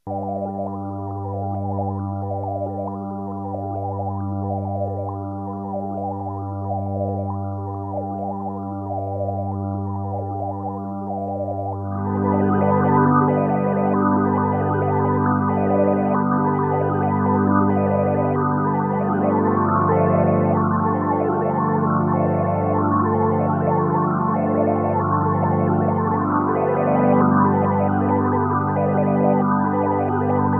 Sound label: hyp tri puls; Scott Stites